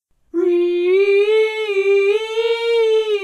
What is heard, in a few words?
REEEEEeeee